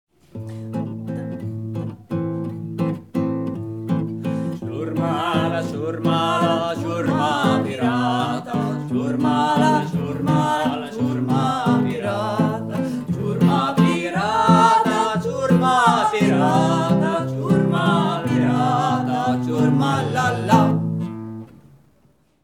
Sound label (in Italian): sigla ciurma balalaika